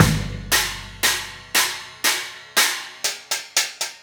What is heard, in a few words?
Cymbal and Kick